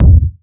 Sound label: kick single hit E key; kick drum samples; drum single hits one shots